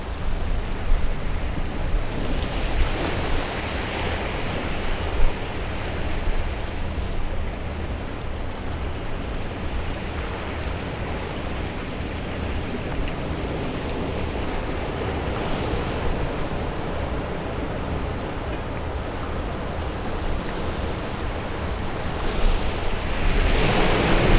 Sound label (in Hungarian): erodalo a hullamok